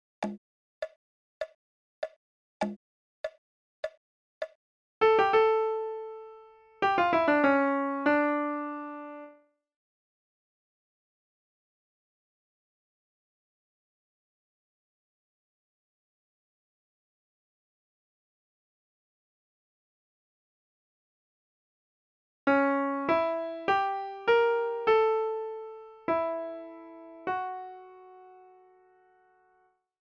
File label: Toccata Fugue Dm RH